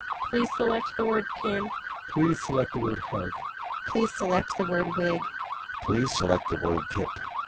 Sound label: siren; Codec